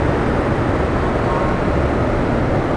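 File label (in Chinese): 城市喧闹; 红色警戒2; 环境音效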